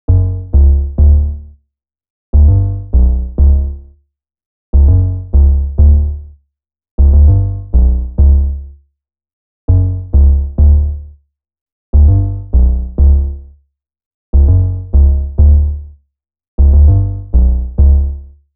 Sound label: Bass 808s Clean After